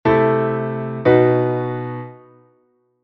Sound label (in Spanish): semicadencia en mi m; Escoitamos frases musicais!